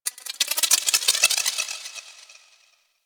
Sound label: Sculpture Spin; Mountain Pass; Warframe; Ambience; The War Within